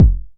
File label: 909 HI KIK MED